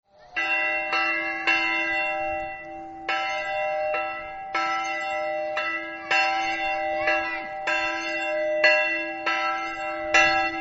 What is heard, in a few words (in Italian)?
campane; venzon